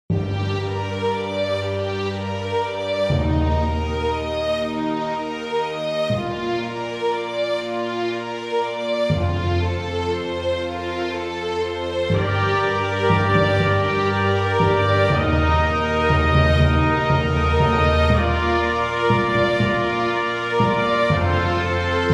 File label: Whalesong